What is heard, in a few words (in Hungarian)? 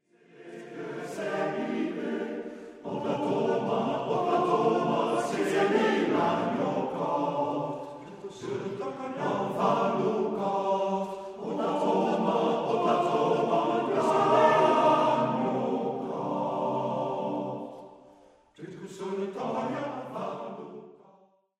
Magyar nepdal